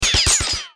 Bullet Impact Metal

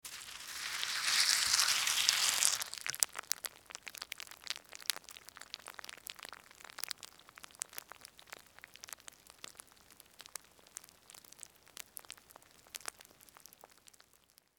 Cereal Milk

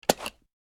Gun Holster

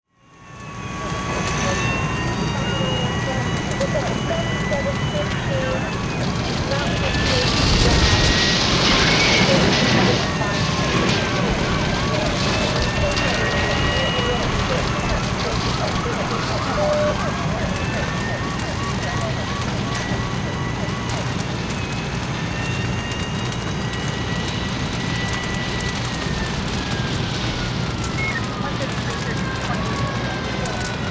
Fire Scene

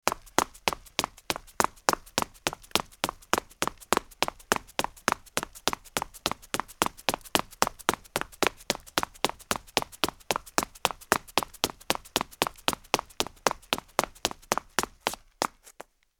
Footsteps Hard Marble Run

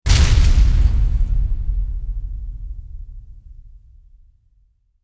Crash Metal

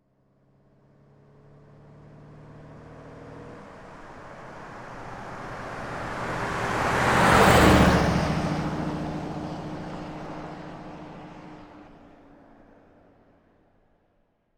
Auto Vintage Big Pass

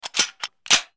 Gun Hand Load

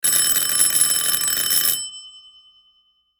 Doorbell

